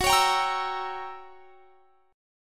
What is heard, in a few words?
F sharp 7th Flat 9th, down; strummed; F#7b9